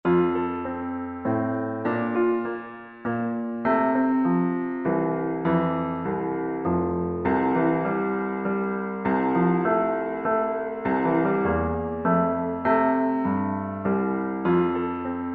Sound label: Minuet in F Major, piano; Mozart